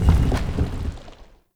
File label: Stone Pedestal Stop; UnderCity